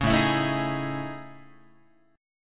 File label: A Major Sharp 11th, Guitar; AM#11; strummed; JGuitar